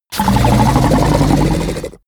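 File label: fishman snort gargle aggressive loud, creatures; kreaturen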